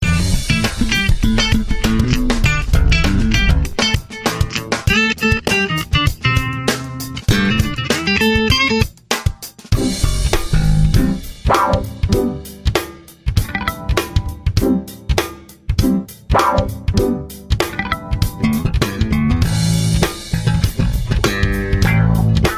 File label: Abstract Funk; CHRONIC MUSIC LIVE